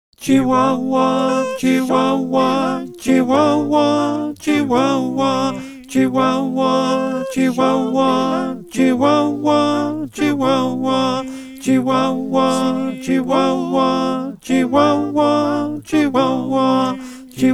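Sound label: alto, choir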